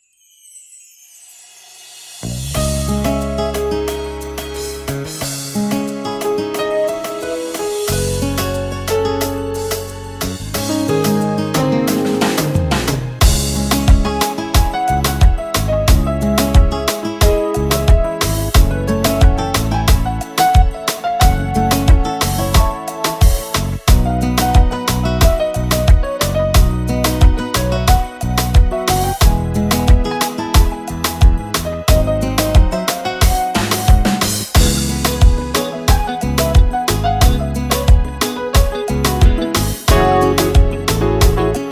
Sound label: zouk love